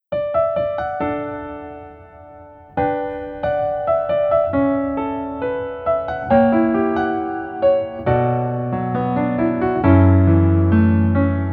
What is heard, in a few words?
Piano